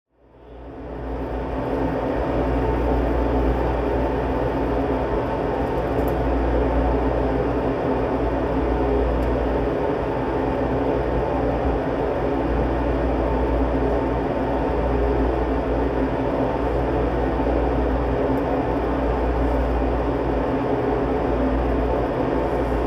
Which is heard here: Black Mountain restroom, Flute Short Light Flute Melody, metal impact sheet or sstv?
Black Mountain restroom